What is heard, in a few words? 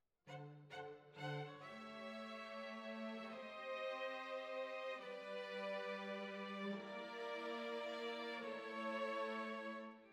Violins Over